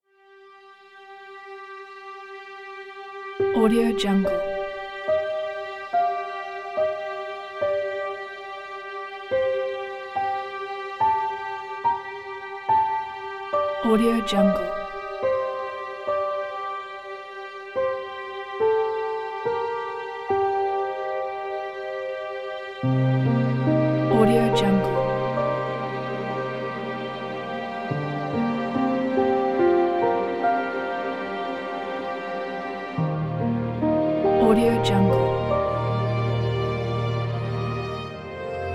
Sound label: drama general dialog hope